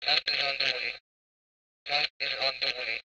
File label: walkie-talkie transmition; devices accessories utilities; NS Studios - ultra sound collection